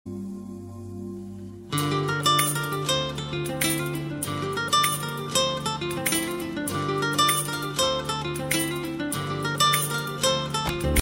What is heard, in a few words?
Guitar Ringtone